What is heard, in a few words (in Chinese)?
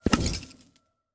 宝库弹出声